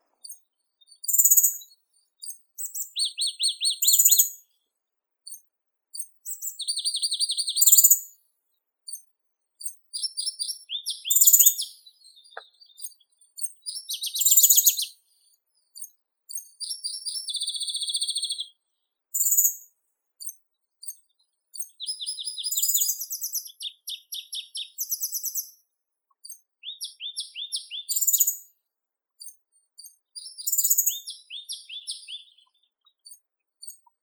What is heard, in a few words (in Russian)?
muholovka pestrogrudaya muscicapa griseisticta